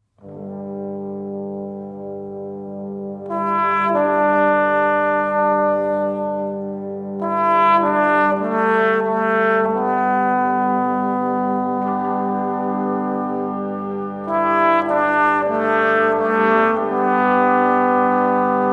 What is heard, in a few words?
brass